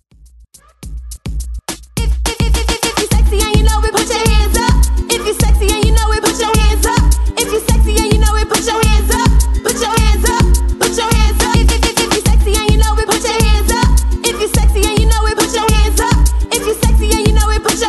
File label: Moombahton Redrum, 105 bpm